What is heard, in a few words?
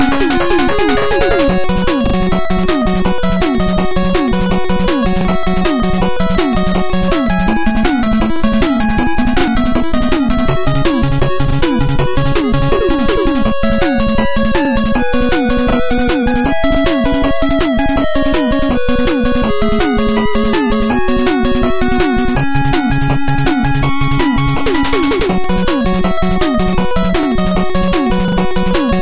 nes game